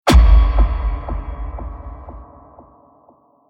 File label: Stinger Zap Impact Stinger Resonace Scifi Evil Robot; Sound Design; Stingers; Tom Hutchings